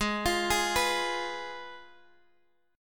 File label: Ab Minor 6th, down, Guitar, Open F; arpeggiated